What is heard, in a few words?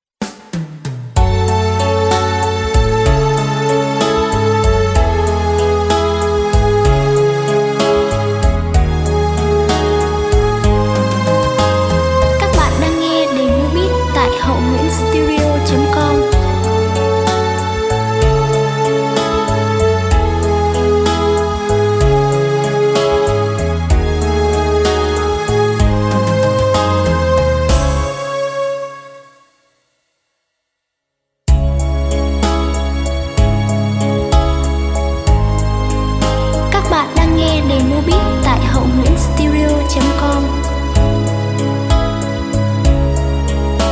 beat, playback